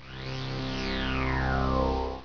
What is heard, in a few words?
SURGE; homm2